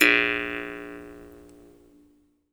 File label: Jaw Harps